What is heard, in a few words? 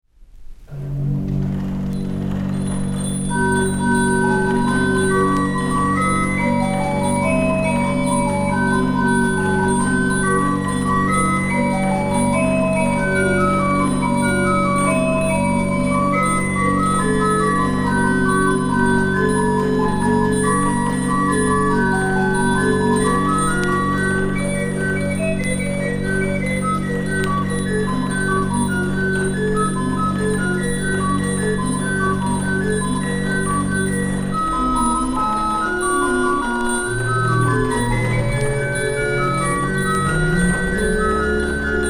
Zimbelstern, Orgel; Weingarten